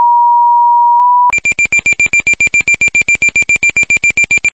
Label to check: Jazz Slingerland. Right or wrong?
Wrong